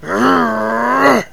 grunt, orc; creatures; sasquatch

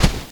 dry sand packed; creatures

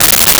coarse gravel, footsteps; creatures

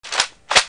main barrel refuel; golgotha

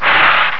heavy item slid on floor; heavy object

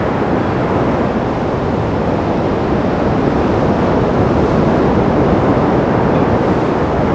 generic windy ambiance; weather; golgotha

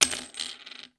coins table

